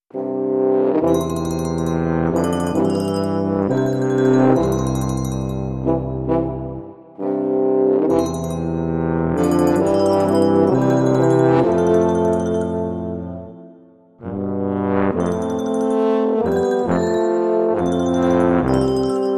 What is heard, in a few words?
Cows And Brass